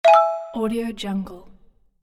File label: infographic message sound effect